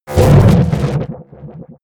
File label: energy shield hit; energie